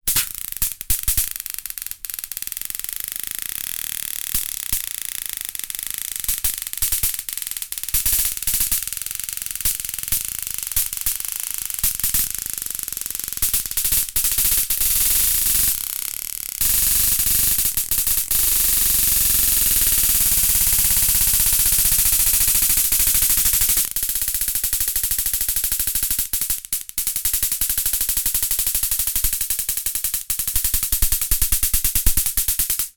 animals insects fly electricution slow zapper close up